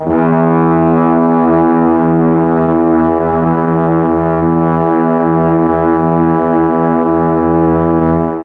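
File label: E4 half speed brass, mellotron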